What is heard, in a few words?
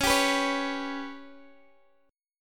G Major Flat 5th chord